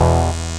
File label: SYN E, BUZZ SYNER